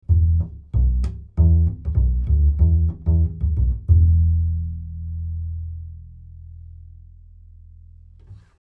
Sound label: Austrian Audio OC818 Upright Bass 40 cm